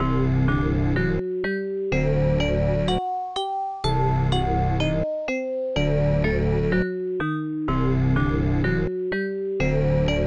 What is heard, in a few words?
Dungeon